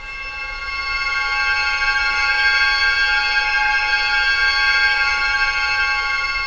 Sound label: ATMO; Optical Media International; Sonic Images Library; SI FX Vol 19; Instrums